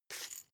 glass pepper grind smooth single; berufe; gastronomie; soundpool

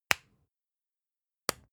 spice jar smack close

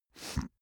sniff grunt; koerpergeraeusche; menschen; soundpool

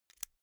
insert xlr extension latch hard; objekte; elektronik

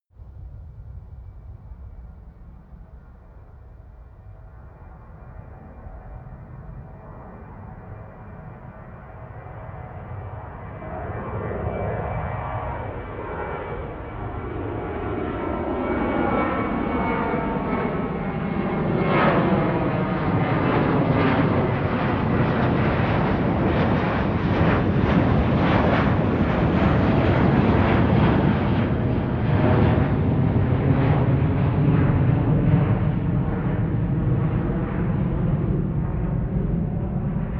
plane flyby land long; flugzeuge verkehrsflugzeuge; verkehr transport

